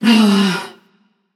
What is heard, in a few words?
ugh passion; chaac